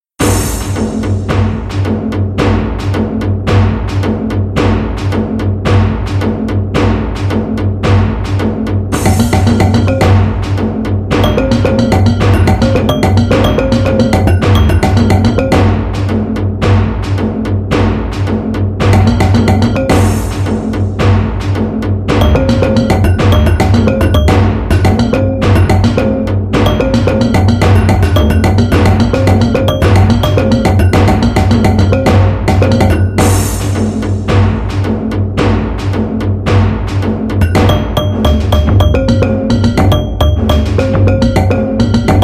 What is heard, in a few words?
Fearless